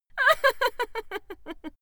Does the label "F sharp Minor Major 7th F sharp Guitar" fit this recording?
No